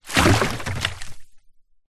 foley wood stick large movement drag in puddle water; zapsplat